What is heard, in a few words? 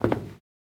Footsteps, Human, Metal, Run, Isolated Steps; backrooms 2d android ios online windows gamerip